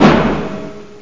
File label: timp drum